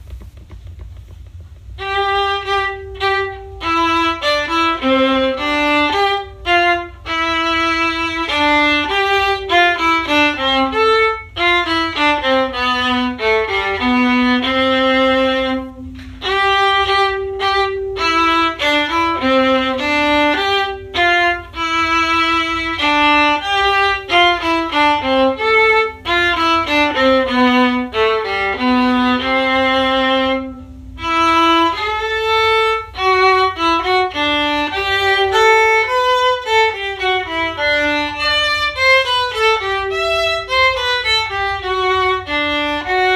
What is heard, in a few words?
Minuet 1 Viola; christmas concert practice clips